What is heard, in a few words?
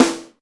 Snare, Alesis DM5